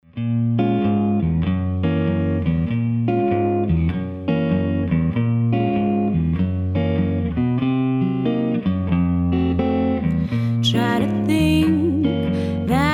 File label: Jazz